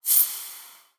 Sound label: UI Production Cycle; Taps to Riches; Android